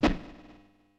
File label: Moderate Whoof; Super Smash Bros